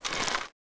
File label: bow pullback; bow deerhunter